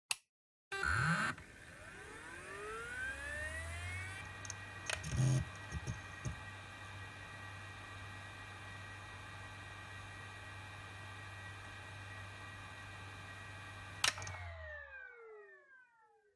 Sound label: Hard Disk Drive (Operating Noise)